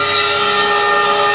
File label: GRIND, Power Groind; E-MU Producer Series Vol. 5 – 3-D Audio Collection; 3DSprints